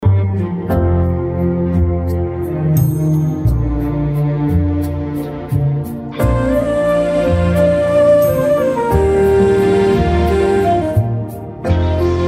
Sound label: Karaoke Song